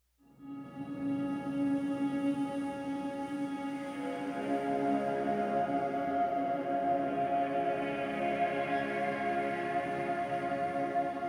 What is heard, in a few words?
Exploration Synthy; Nora